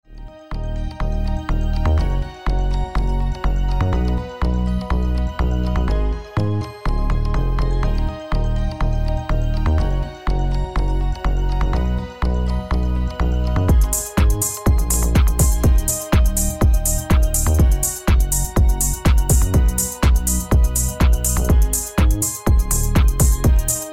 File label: Pulsating Beats and Synth Waves